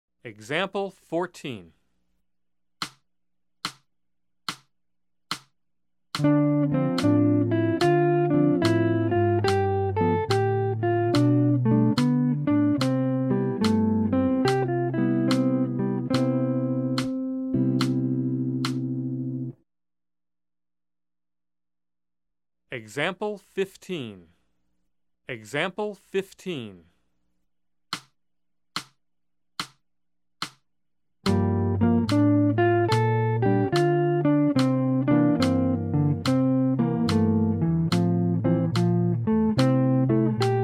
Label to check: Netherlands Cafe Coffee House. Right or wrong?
Wrong